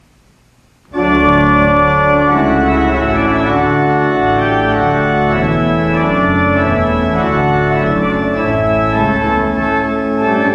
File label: Trumpet Voluntary in D